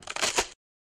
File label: crunch; sorcery